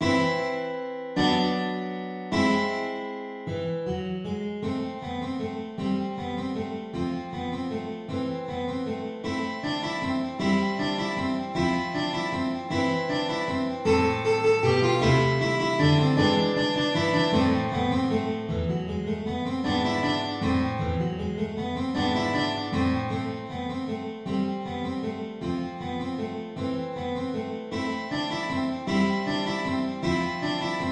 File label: guitar